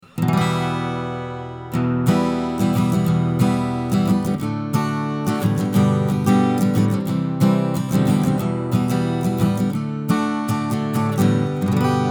Neumann KM83 Acoustic Git 20cm

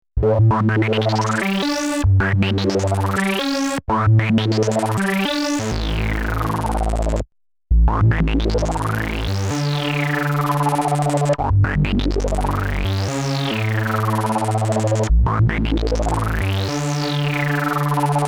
lfo speed mod moog

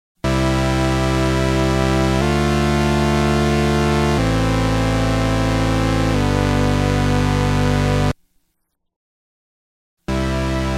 boss dc2w 4 modes bright

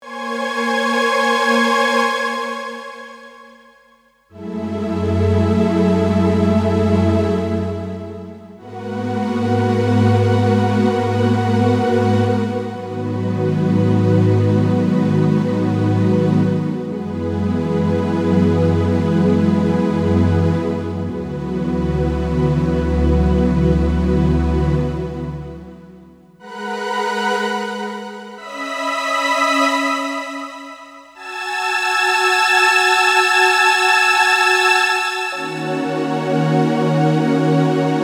MINIFREAK

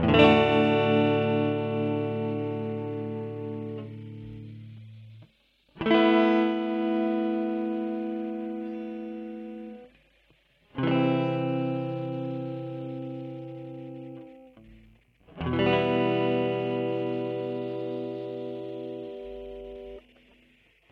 Boss DE 200 Digital Delay Gitarre

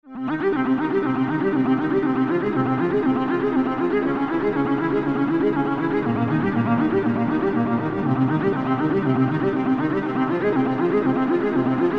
Casual Chaos

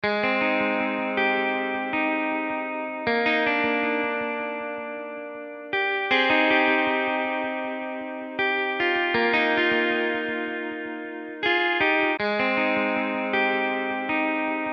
Guitar Clean

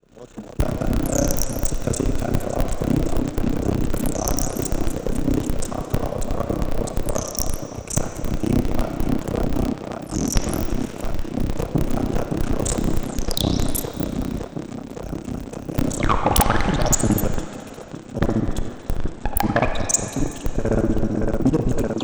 Uno Synth Pro X

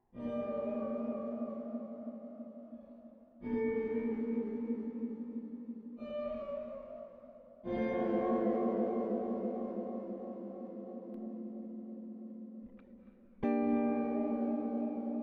Spring; Golden Reverberator

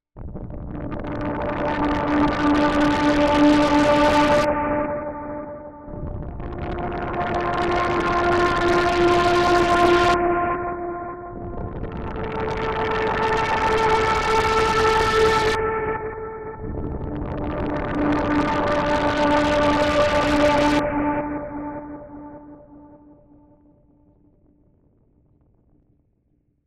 Matriarch

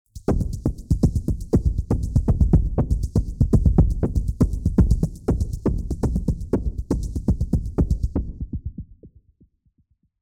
Signal Tape Loop Expansion; Halfway There Skool Me

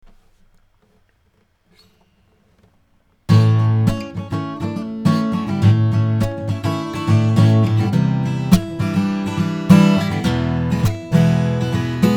Strumming Mic

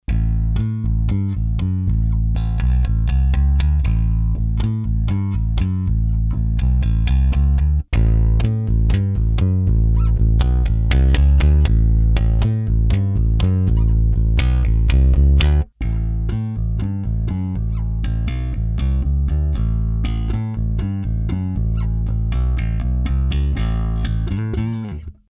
Clean Live; Rickenbacker

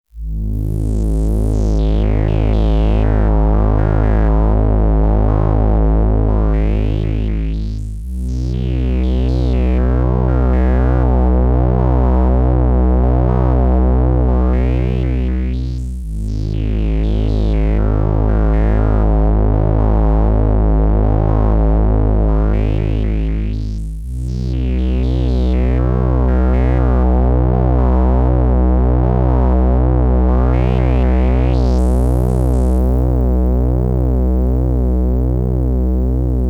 Slow Attack

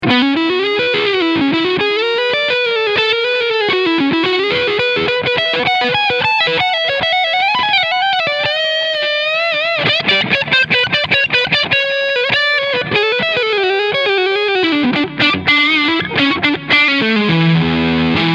Asat Special Front PU Overdrive; GL Tribute

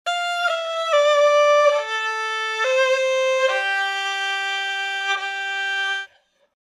Gaohu Sustains Legato; Phoenix Orchestra

